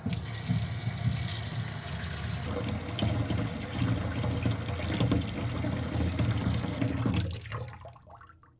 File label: kitch; Mafie 1